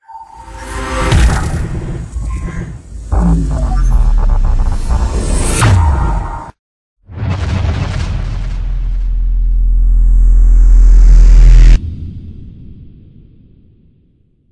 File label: Splash; Guncraft: Blocked and Loaded; Xbox 360